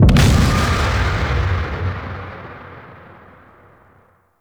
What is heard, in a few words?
Sword Assembly Call; Gameplay; Ballas; Warframe; Chimera Prologue